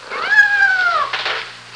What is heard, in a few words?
Cat meowing vintage